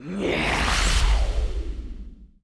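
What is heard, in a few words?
sorcerer effect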